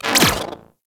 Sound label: Split Laser Retract; Warframe; Weapons; Corpus